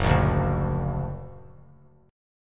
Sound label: Gb Augmented; Gb+ strummed